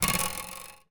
cartoon bounce diving board; zapsplat

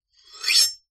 warfare knife blade draw

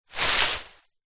foley bushes tree leaves brush past

